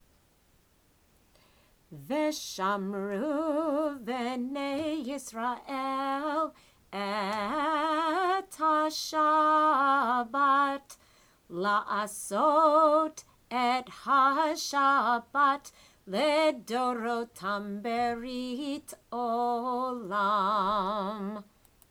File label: V Shamru chorus; religious school; student prayers